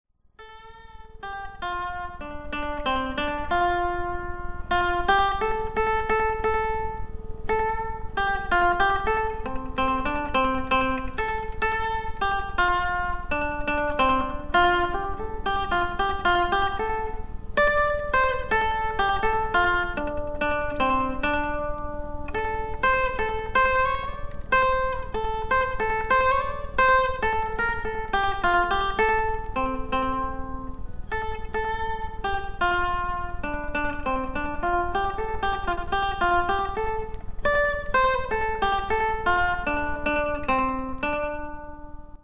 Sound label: Whistle